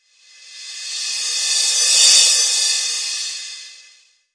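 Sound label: Crash, classic hip hop; STYLE KITS; Classic Hip Hop Mega Kit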